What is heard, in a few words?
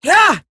pain, female; Warframe; Eximus Grineer Female; Battle Barks